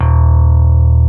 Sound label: ELECT. BASSES; OMI - Universe of Sounds